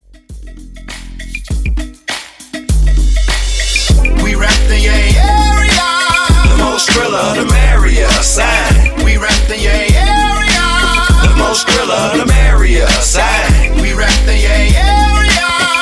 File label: Wordplay Segue 100 BPM